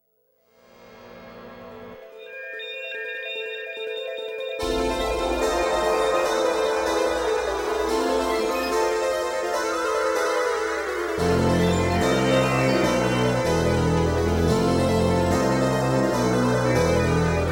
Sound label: Music- New age Chaos